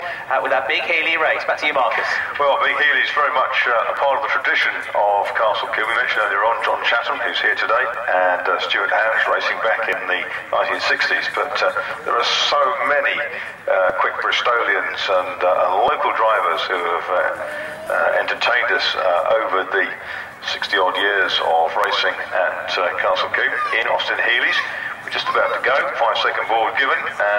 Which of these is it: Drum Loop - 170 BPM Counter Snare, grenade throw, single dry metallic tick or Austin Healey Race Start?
Austin Healey Race Start